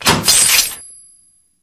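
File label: use currency unlock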